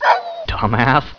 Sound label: pain, dog; butthead